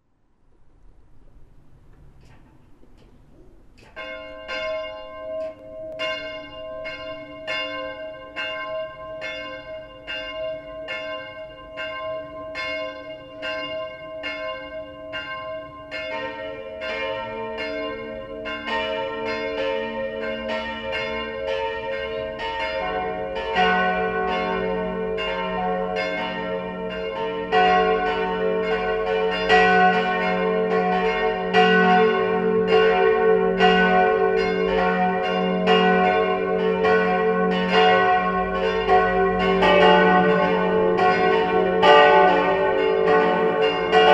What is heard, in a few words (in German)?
Glocken laeuten; St. Laurentius; Alteglofsheim